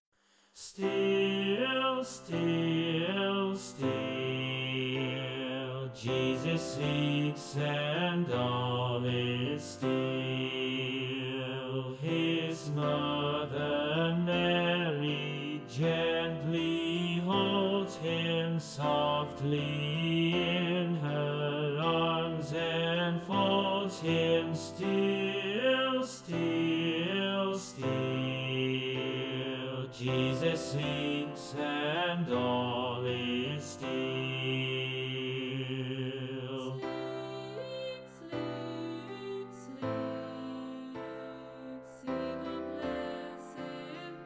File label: Tenor; Still Still Still